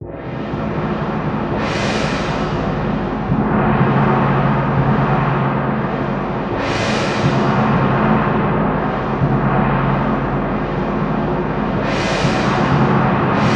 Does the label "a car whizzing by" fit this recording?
No